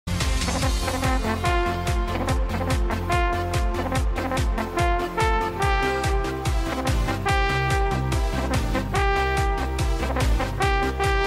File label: alphorn